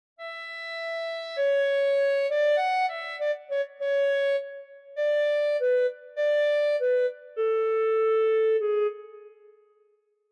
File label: clarinet; garritan; sibelius sounds essentials